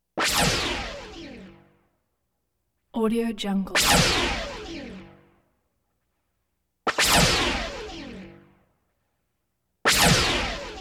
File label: scifi weapon